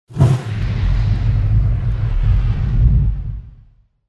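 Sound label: flamethrower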